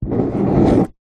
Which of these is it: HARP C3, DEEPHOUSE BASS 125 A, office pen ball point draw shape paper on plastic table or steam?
office pen ball point draw shape paper on plastic table